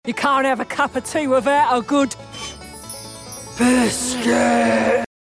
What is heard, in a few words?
Chew Chew; Biscuit